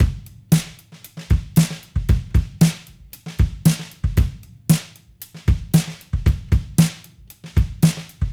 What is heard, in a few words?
Cruisin' Disco Drums, 70s Analog Funk; Sonic Foundry (Sony Creative Software); What it Is!